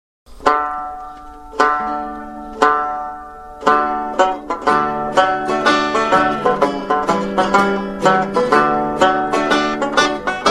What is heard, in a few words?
hornpipe; galway